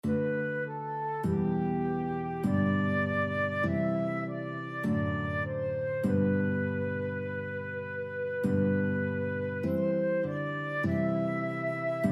flute guitar duet

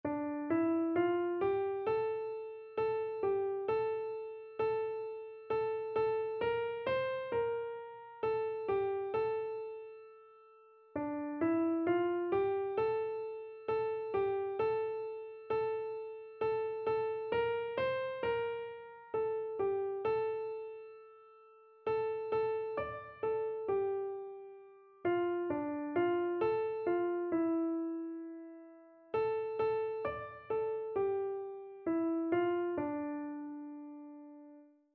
let all mortal flesh, keyboard

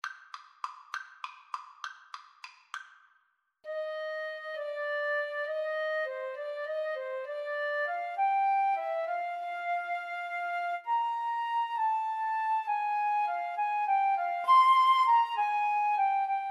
waltz, Flute-Clarinet Duet

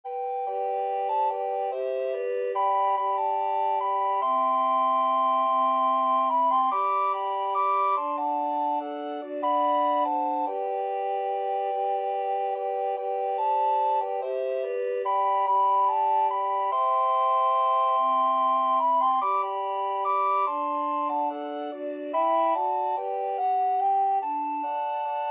recorder quartet

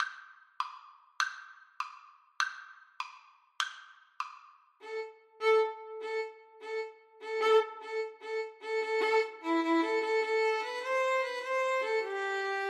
violin duet

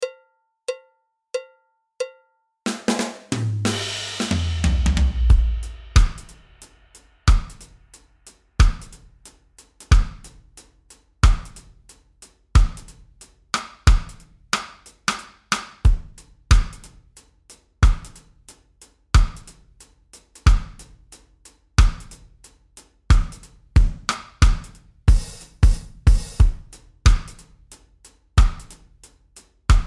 one drop reggae feel, drums

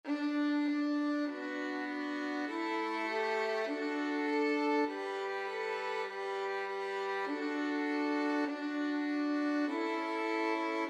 violin trio; holy holy holy

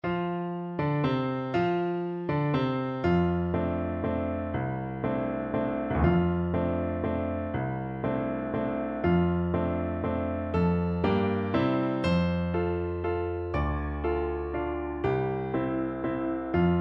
horn, French Horn

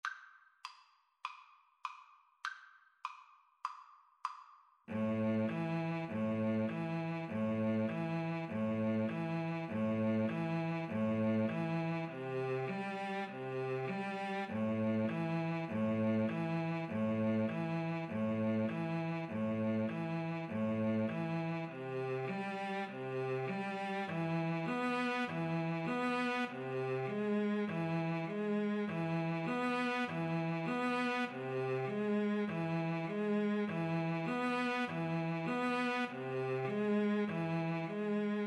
grieg mountain king, Flute Cello Duet

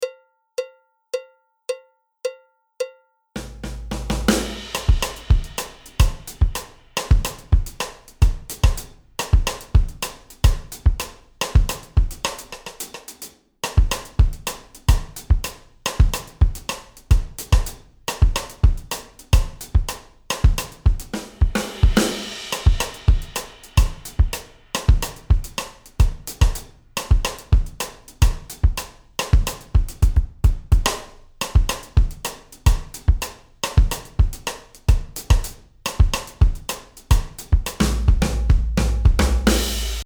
afro cuban, drums

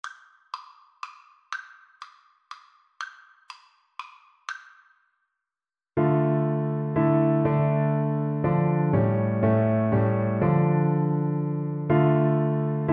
we three kings, Percussion

